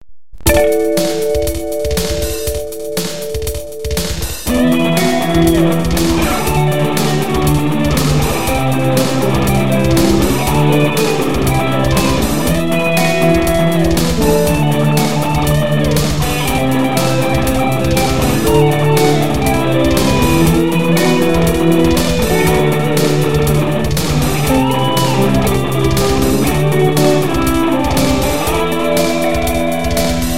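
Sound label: instrumental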